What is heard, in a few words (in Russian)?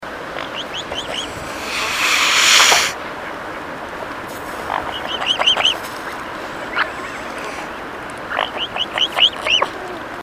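lebed shipun golos, mute swan voice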